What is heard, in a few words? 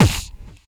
VOCDRUM, MOUTH PERC; Best Service; Hyper Dance